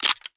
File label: sks insert